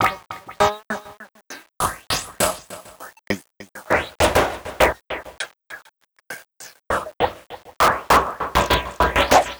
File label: Envelope Beat; Drums & Drones